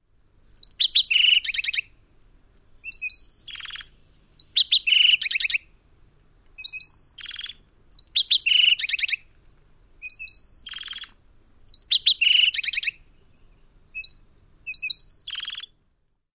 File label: two males countersinging; AZ